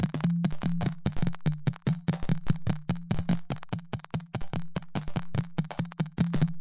rattle click plip pop, drum loops and breaks; 141 to 160 bpm

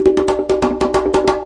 125 bpm, conga roll

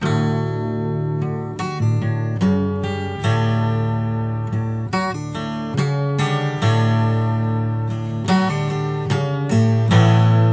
12string; remixable collections; work-sin-progress

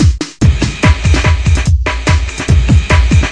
145 lots a kicks, drum loops and breaks; 141 to 160 bpm

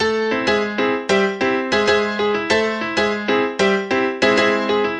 096 salsa piano; KEYS ORGAN PIANO LOOPS

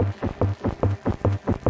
crunch, VOCAL OR VOXY LOOPS; melodic loops; 143

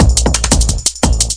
174 kick and shakers dnb; 161 to 180 bpm

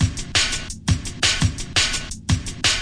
170 gated off step, drum loop; 161 to 180 bpm

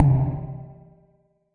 verby tone A1, Verby Tonal Kit; UNUSUAL KITS and FX

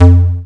bass; SCARs FNC Bass

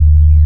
basss

